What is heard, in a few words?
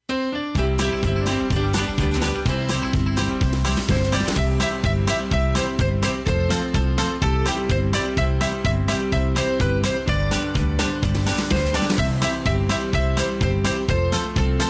when the roll is called up yonder harmony vocal, square dance music